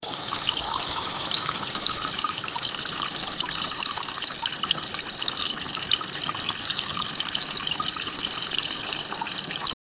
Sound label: water sound effect, flowing